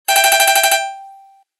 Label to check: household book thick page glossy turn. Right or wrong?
Wrong